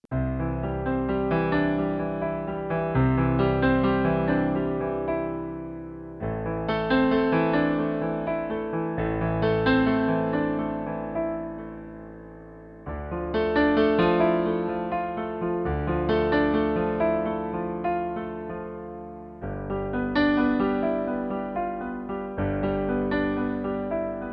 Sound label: piano